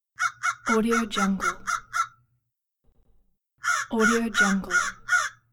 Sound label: crow calling